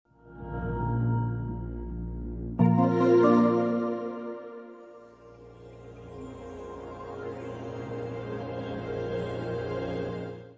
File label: ps5 boot